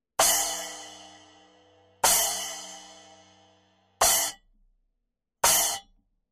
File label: Meinl CC10S